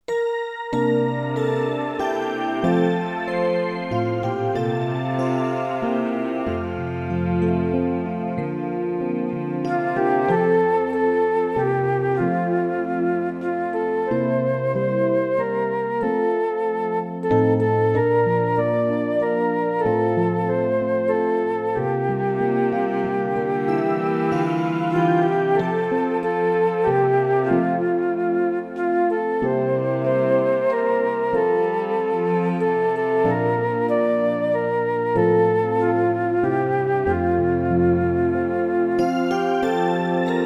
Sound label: instrumental